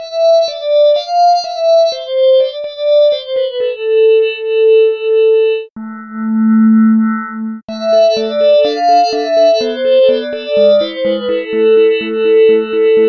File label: metal pad samples